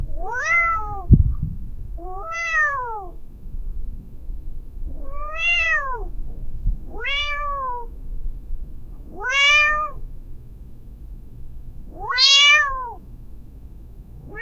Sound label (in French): bruit de chat qui miaule, pet